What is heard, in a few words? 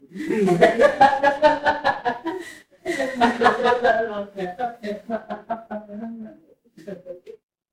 crowd laughter